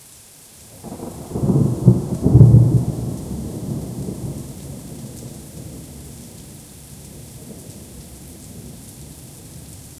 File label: soft rain distant thunde